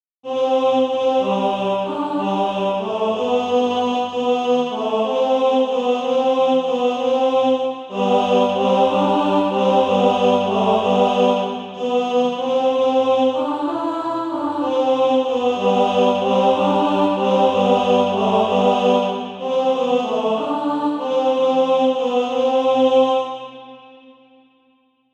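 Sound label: tenor, Practise Music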